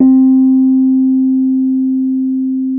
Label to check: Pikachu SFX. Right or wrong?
Wrong